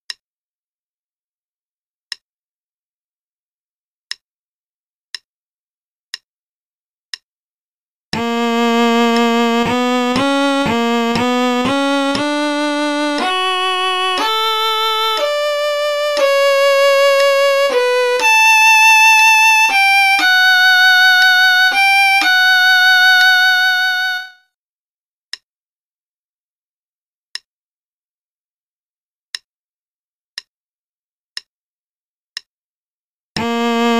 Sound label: Play-a-long Concerto in B minor, qn=60; Rieding